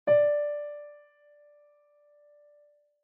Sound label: D5 piano note